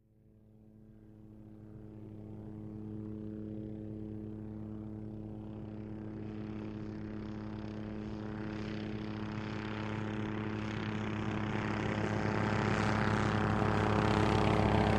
OH6 Loach By Medium, little bird

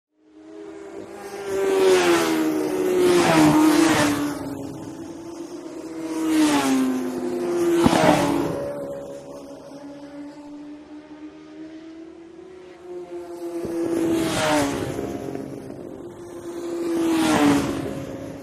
Motorcycle Road Racing

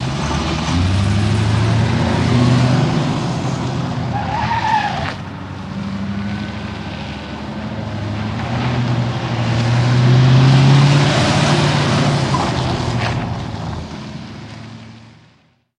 Cadillac Out U Turn And By Fast

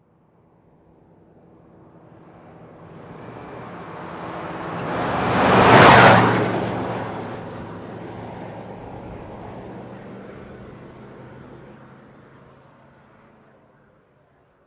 Expedition Very Fast, ford expedition

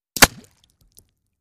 Bullet Impacts Water

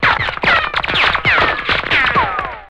Bullet Ricochet Ban, riccos

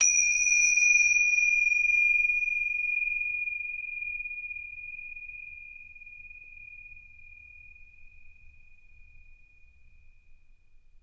Tibetan Bell Strike, bells

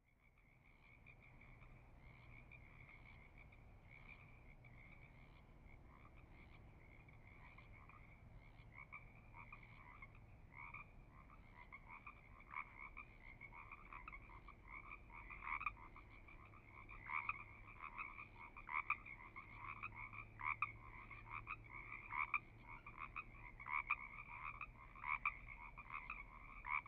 Frogs Croaking Mediu

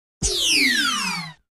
Synth Energy Zap Desc

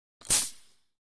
Biking Short Hissin

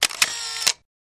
35mm Camera Shoot Au; shutters & movement

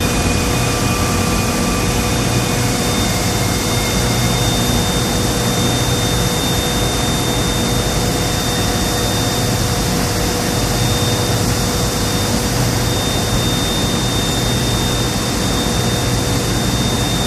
Petroleum Plant Hum

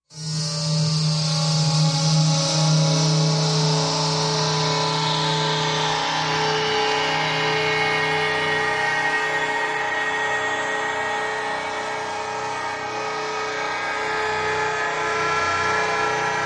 Soul Grinder Machine, horror

